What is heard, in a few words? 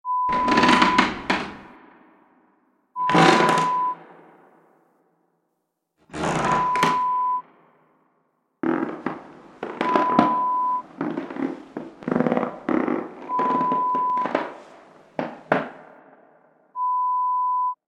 Creak sounds